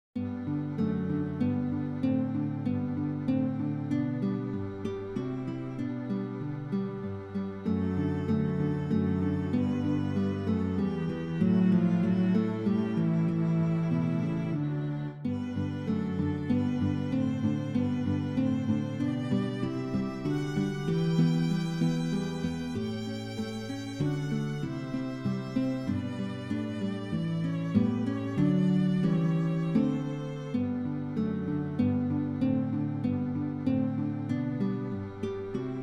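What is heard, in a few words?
Bagatelles with string quartet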